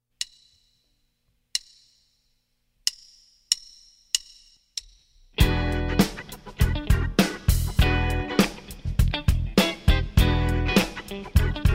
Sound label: with drums